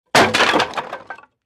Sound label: Chair Collapse Sngl B